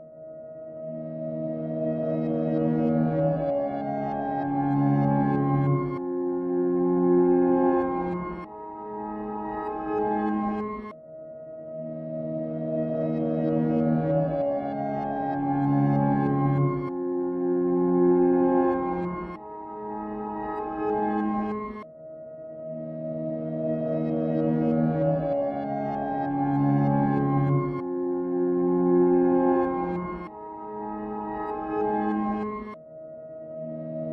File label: Serenade; yume 2kki original game soundtrack pt 2; android ios linux macos mobile windows